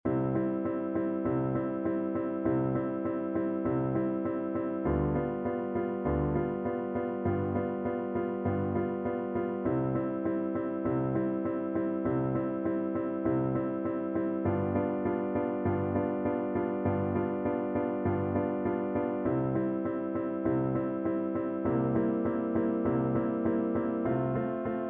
Runs High Voice Accompaniment; MTB Singing Vaccai

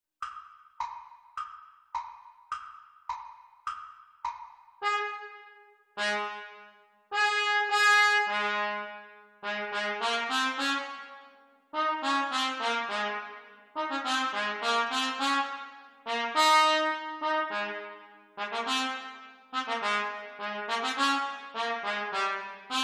Trumpet Duets; Grade 5; Exam Speed; Cornet Duets

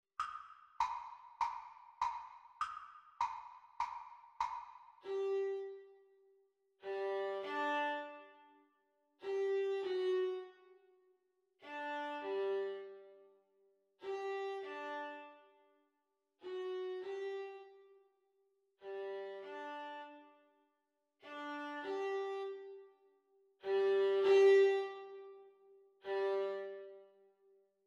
Viola Duet Playalong Exam Speed

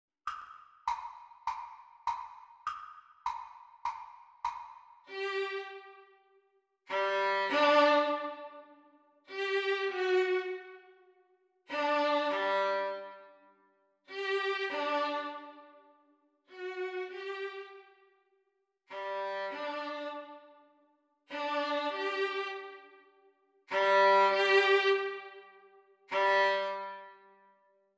Violin Duet Exam Speed

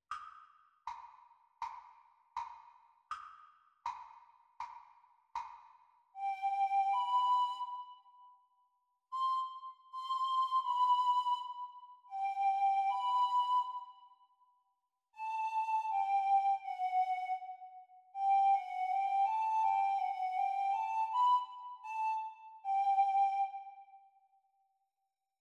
Descant Recorder Practise speed

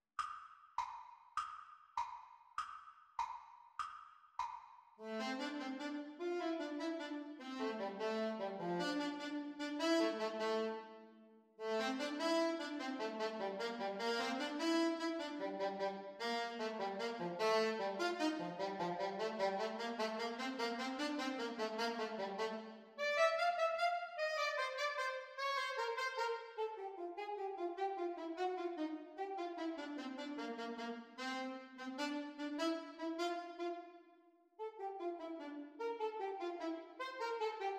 Sax Duets Playalong; Exam Speed; Alto Saxophone duets

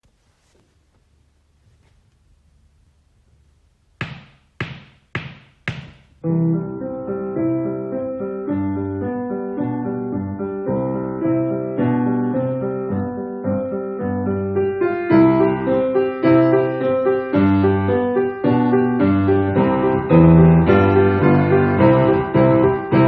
Piano Duet exam speed; Grade 5; Diabelli

